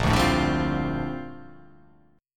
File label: A sharp Major 13th A sharp